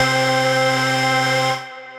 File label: Synth Lead